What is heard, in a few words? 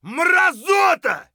fight; Escape from Tarkov